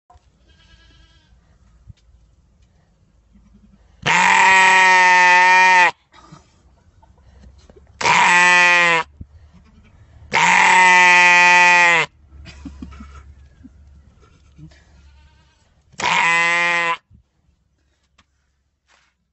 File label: Funny Screaming Goat